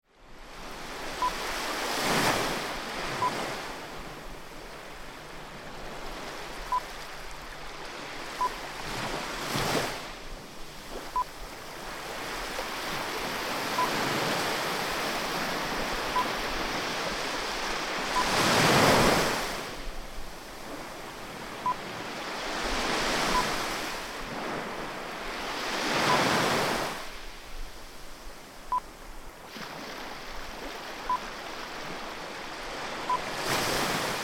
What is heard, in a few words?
Beach; Gili Beach